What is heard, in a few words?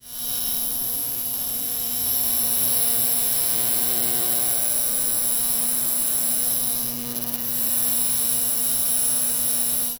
the sound of a flickering, buzzing